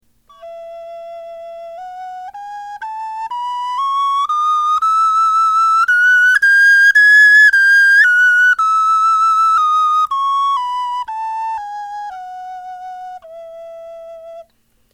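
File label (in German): tonleiter, knochenflöte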